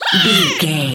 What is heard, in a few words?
goblin fairy attack special